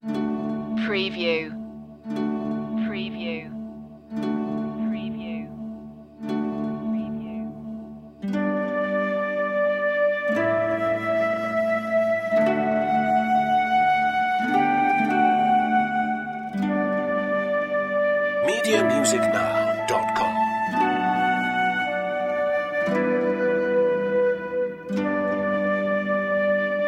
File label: flute harp mix